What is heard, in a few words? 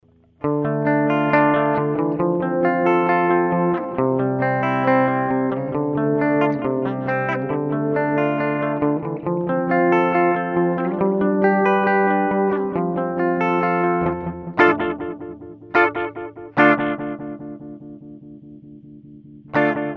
ELUR K-IV Analog Delay